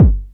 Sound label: fluffy steel kick drum g key